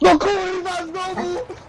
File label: bleh rage